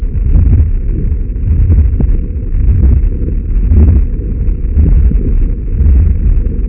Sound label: Bronchovesicular, lung sounds; breath sounds